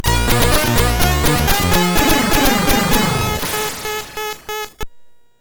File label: Game Music, sid MOS6581R2